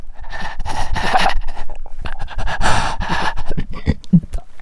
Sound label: Soldier Shell Shock; 1916 Der Unbekannte Krieg